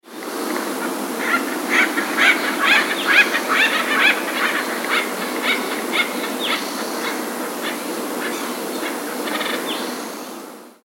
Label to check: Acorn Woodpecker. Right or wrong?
Right